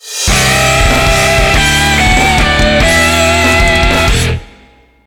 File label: fanfare; Otome the Exorcist